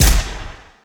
Pistol Fire Longer Tail

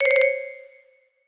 Jingles; Ratscat

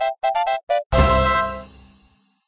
GOAL!; Gnomeball

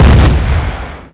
Weapon AT Gun-Heavy

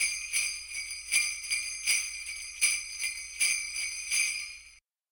MUSICAL JINGLE; lego star wars the force awakens 2016 ps4; SNOWMANJINGLE

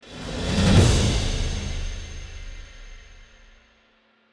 Combat Stinger

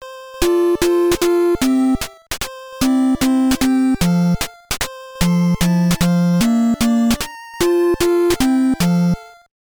Round Clear [Happy Birthday]; Mappy Land; NES

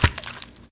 Miss

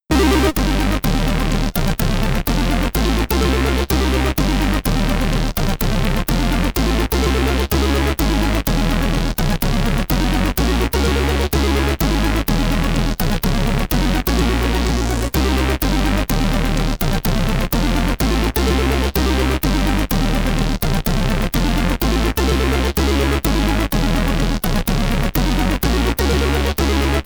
Loading Theme (SID Stereo); Crystal Fever; Commodore 64

